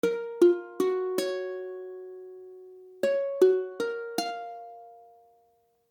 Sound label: Fismaj7, akordy ukulele; F#maj7